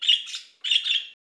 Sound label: bird